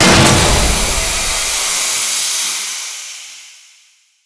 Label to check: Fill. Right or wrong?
Wrong